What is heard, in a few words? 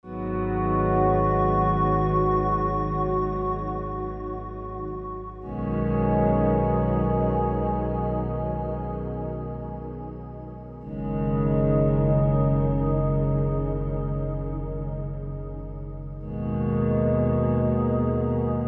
relaxing cinematic pads